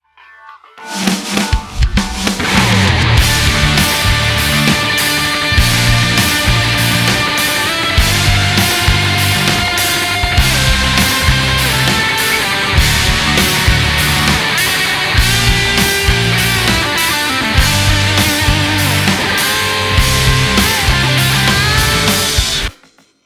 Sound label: End Music; frontlines fuel of war gamerip 2008; OXM